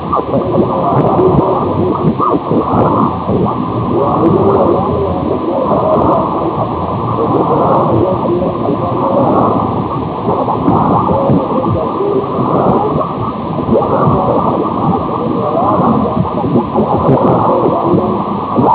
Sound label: 729, AM; newcal; Grayland Beach State Park, WA; Perseus & various antennas